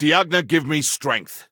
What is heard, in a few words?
attack; guildsworn wayfarer